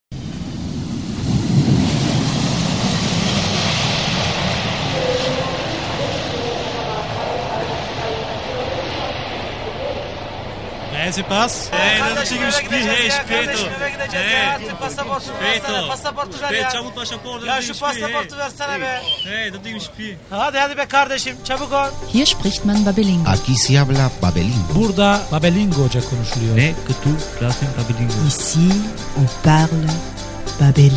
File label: jingle